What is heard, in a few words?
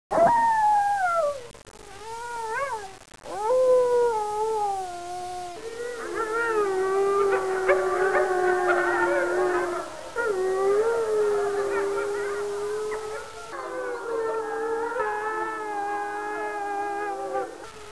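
wolf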